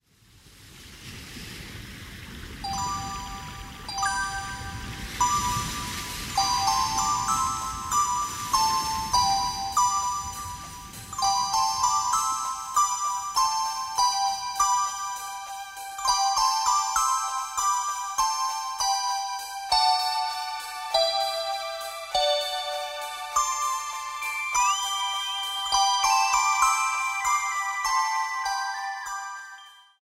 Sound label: waves